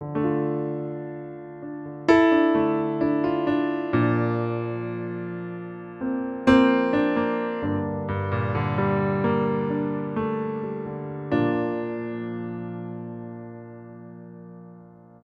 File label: Short piano in Dm; admiralbob77